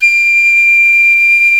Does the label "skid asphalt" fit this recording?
No